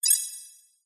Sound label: multimedia game tone short bright futuristic beep with delay action tone; zapsplat